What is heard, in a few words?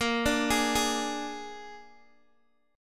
A sharp 7th A sharp, Guitar, Open D; x,13,12,14,11,x